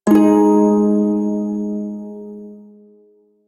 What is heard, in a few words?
Prime Shawzin Chromatic Chord F; Music; Warframe